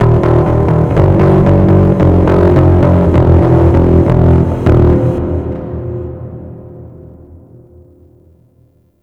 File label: Stage Bass Guitar; Warframe; Ambience; Nineteen Ninety Nine; Obj Temple Defense